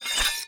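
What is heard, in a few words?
infantry sword, weapon; Battle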